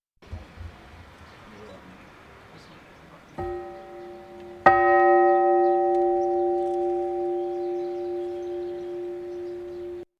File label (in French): cloche; Landas